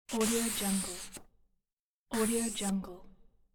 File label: paper slide